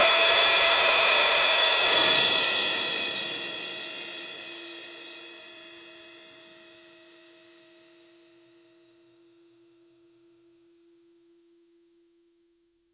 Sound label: drum pack bass, bass drum